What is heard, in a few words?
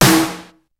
kicks snare, kid; Kid Nepro; Elite; KICKS SNARES